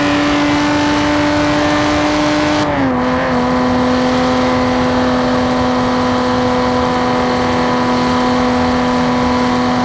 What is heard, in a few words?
fourth cruise, vehicles; gmod; tdmcars; fer 250 gto